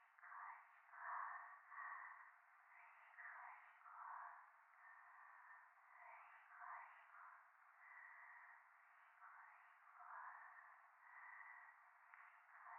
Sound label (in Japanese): しこしこ 雨; 夢の中